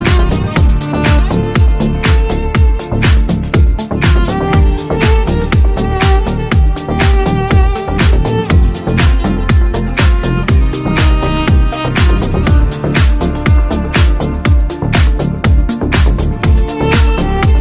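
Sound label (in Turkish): ücretsiz türkish zil sesleri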